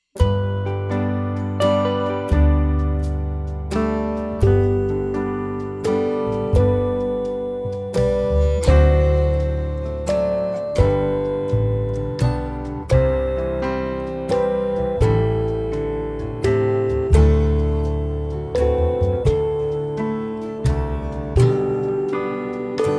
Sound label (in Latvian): Lens valsis in G, Flauta; Demo ar melodiju